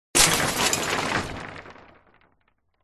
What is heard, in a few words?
crashes junk various; Audio Mangler